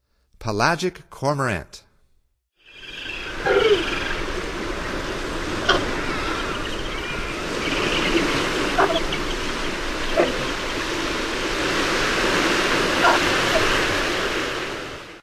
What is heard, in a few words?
Pelagic Cormorant, Songs of Birds of North America; Stokes